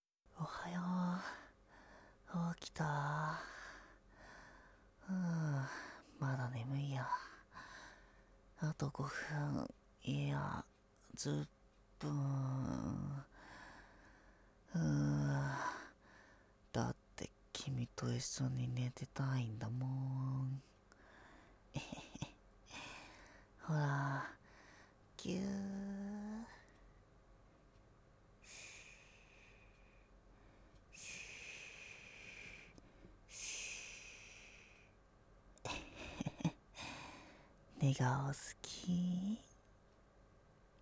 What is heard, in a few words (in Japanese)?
ショタボ寝息 L; 左耳Ver